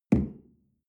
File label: coffee place tablecloth soft